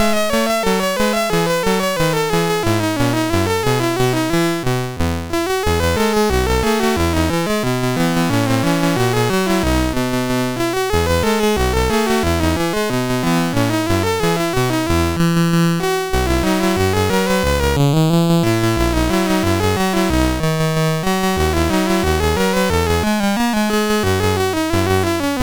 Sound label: Digi Hits, MOS6581R3